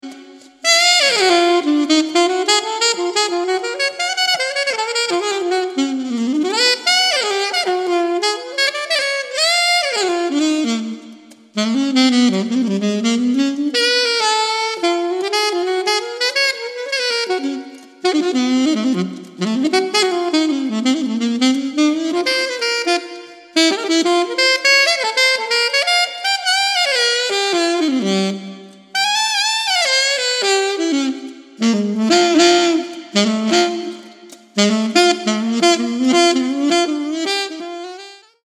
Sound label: solo jazz saxophone; 3 pieces for solo jazz saxophone